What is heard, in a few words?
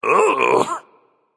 ugh, voicepack; smite; jorm